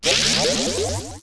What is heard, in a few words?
fairy dusty